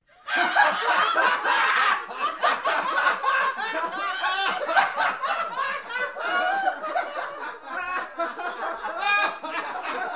Gathered People Hysterically Laughing

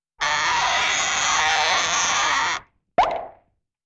Funny squishing and pop

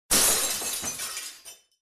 Shattering Glass

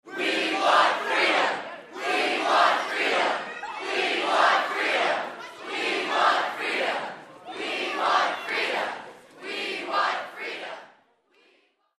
People Protesting

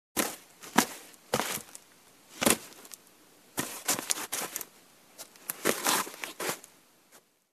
Clothes being packed sequence

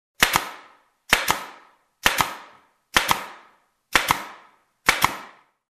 Staple Gun

